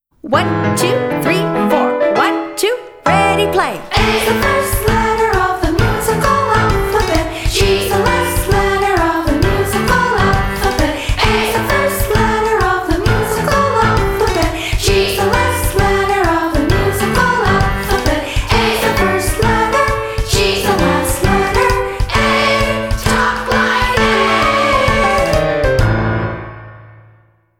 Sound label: Bass Clef Chant